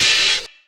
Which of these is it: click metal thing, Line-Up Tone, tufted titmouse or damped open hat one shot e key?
damped open hat one shot e key